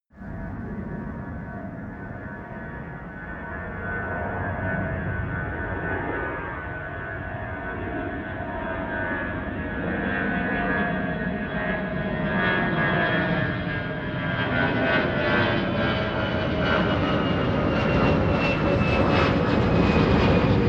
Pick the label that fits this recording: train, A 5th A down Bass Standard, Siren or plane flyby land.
plane flyby land